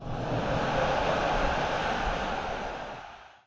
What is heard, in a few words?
Football match lose